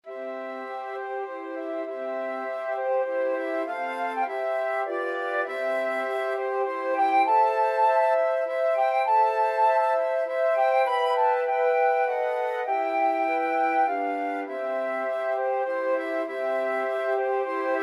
flute quartet

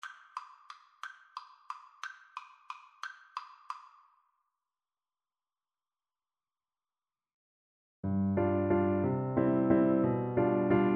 Chopin Waltz, Cello; Op18

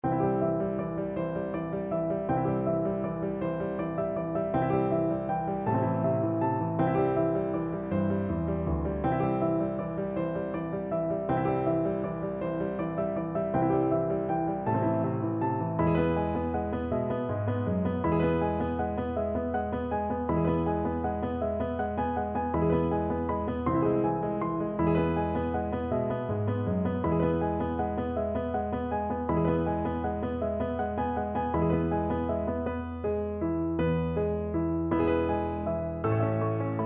piano, piano duet

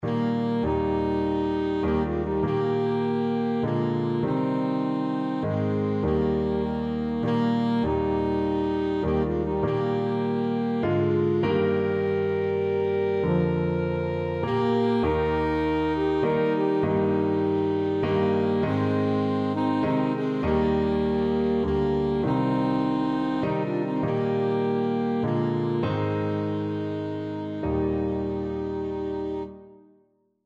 alto saxophone duet

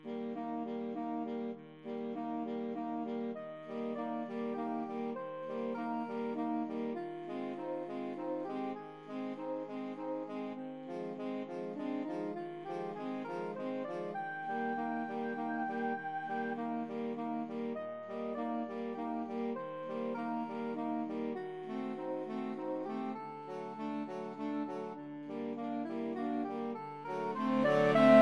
saxophone quartet; saint saens swan

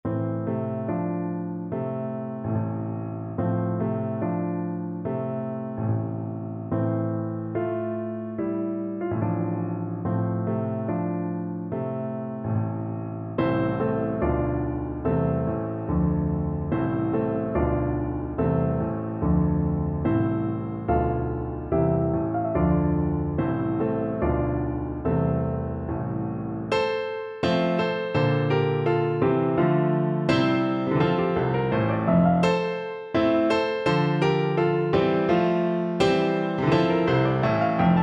volga boatmen, piano

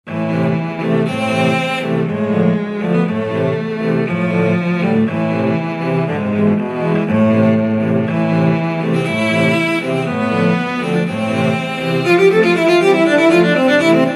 cello quartet

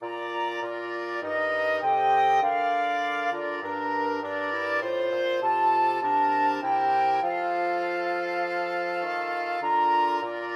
flexible mixed ensemble 4 players

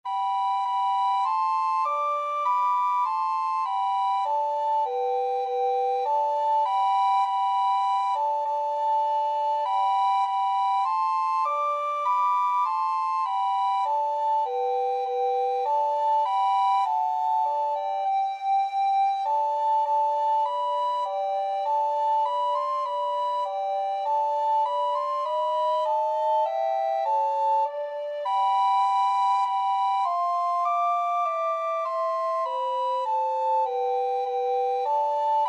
ode to joy, recorder duet; beethoven

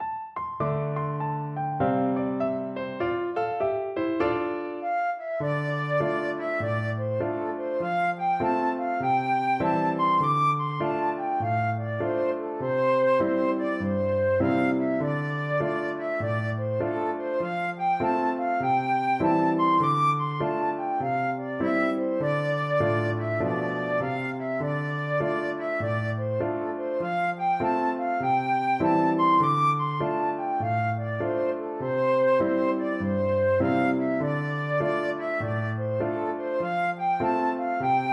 bonapart crossing the rocky mountains flute

